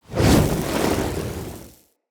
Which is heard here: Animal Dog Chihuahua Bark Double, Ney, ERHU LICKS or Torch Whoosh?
Torch Whoosh